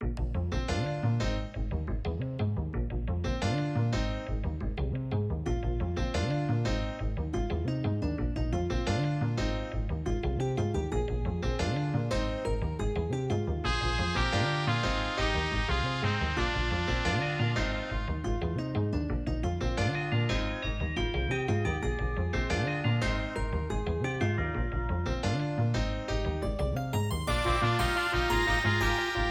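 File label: level1, Creative SoundBlaster AWE32 ct3990 external player GM emu; soundcard test; Hocus Pocus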